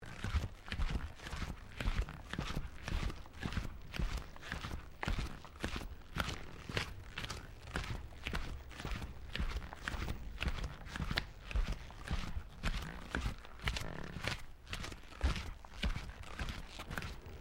Footsteps Ceramic Tiling Sequence; Crocs; Footstep Foley; Footsteps (Sound Effects:Human)

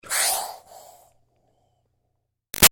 Steam Release Iron Chuff Doppler; Miscellaneous Household Sound Effects; BigRoomSound

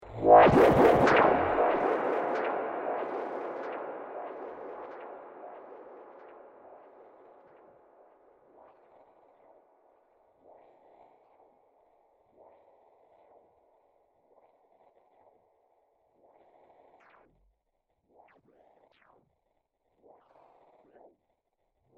Sci-fi Impulse Echo Blaster; science fiction sound effect

